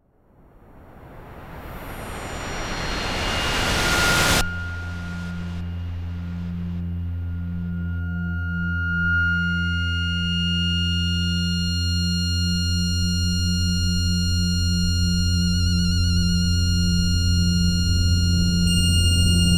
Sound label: spectrum2 four tracks